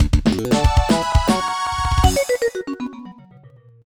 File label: Jingle Level Complete; Nicktoons Unite; DS